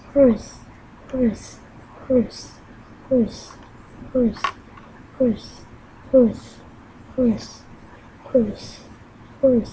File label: horse